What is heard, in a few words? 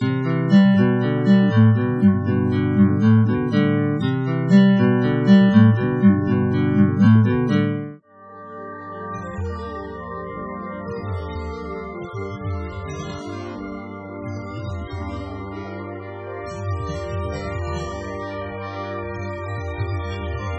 compmono sinusoids